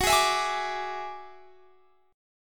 F sharp 7th Suspended 2nd Sharp 5th, Guitar, Standard; F#7sus2#5 strummed